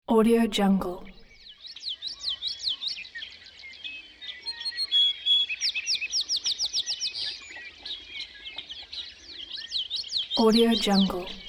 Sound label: nature sounds